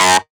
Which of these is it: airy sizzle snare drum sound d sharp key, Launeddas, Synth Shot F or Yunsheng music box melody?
Synth Shot F